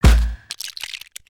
cavalry on death; creatures organic

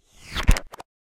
Pet Cat Slide; creatures pets

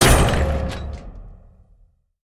Generic Complete

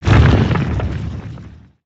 Earth Swing; Spinjitsu

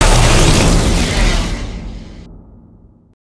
Egg Shock Smash; destructibles

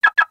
Detector Warning Stop; gnarled forest

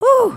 wave, emotes

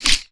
brick move thin; build mode

